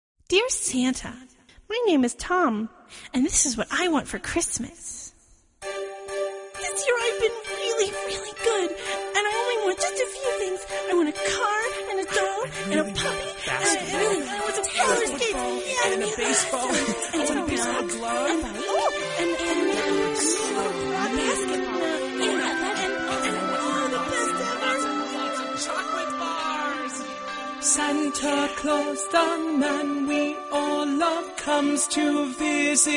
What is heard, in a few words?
tom